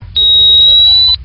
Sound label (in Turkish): Nuk sonari